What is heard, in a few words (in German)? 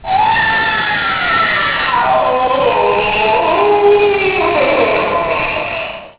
Geist Geheule